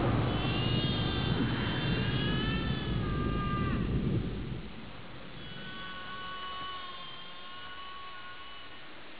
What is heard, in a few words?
scream; spock